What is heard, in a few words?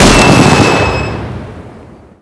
Barrel Explode